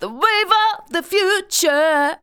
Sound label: vocals sung; Techno Trance Essentials